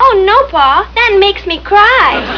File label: cry